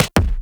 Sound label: Weapon Punch Double